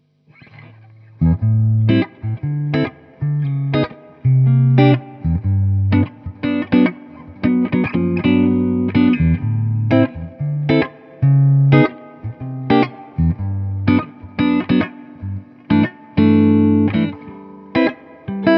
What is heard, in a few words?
Clean